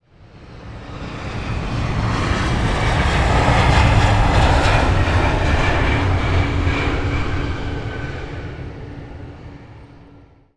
passenger plane landing on runway

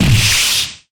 high kick

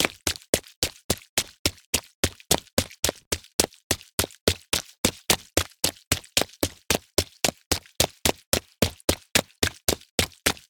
running on rainy road

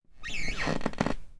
creaking floorboards

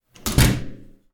closing bathroom door

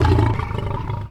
african lion growl

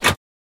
raise a pinball flipper

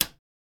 pushing electric switch

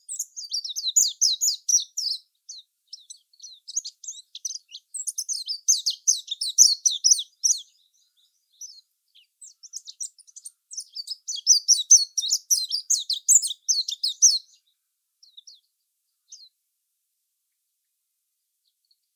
zosterops japonicus twitter

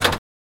lower a pinball flipper

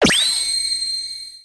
flash of light

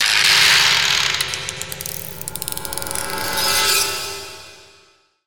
weird noise, anime

